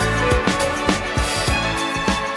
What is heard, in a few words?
violins